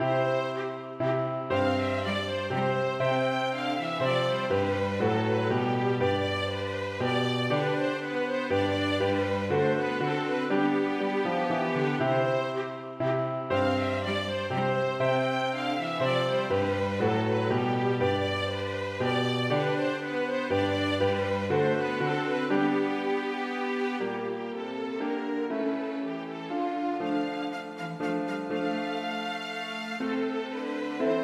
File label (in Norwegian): Menuet for fiolin, cello og piano; menuett fra første halvdel av 1700-tallet; Aamodt